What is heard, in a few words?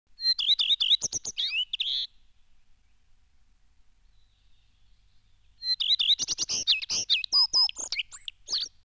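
common redstart voice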